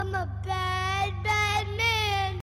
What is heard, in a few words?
Notifica